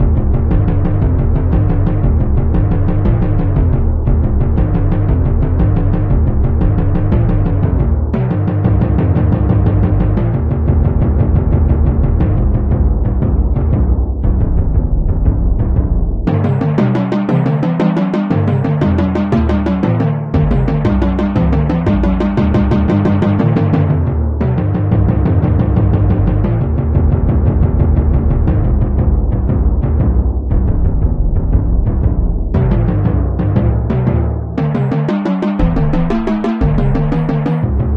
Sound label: Drum; The Bard's Tale Remastered Trilogy